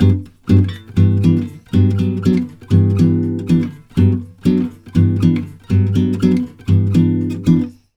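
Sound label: 120 guitar G, brazil bossa; USB Soundscan; AKAI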